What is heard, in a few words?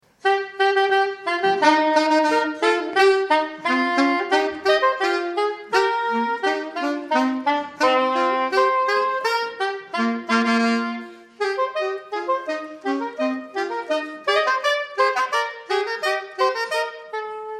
Carillon; Eric Satie